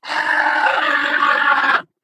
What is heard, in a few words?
ballbot laser burn